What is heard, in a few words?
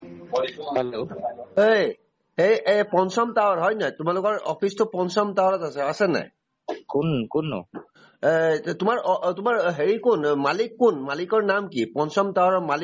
Crowd